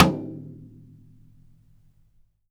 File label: SURDO RIM; BRAZIL